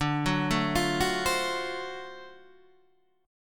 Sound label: D 7th Sharp 9th D down Guitar Standard; arpeggiated; JGuitar